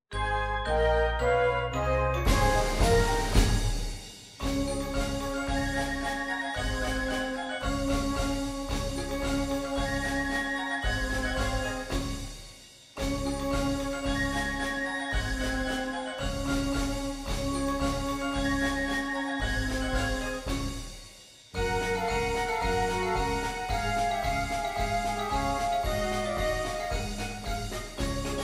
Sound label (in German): Marsch; Hilo Marsch